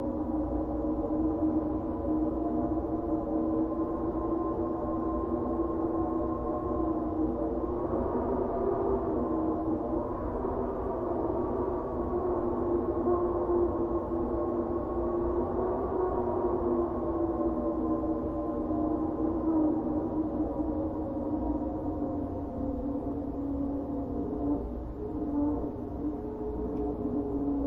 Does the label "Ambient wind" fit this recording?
Yes